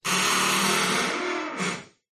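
household door wooden creak room perspective distance reverb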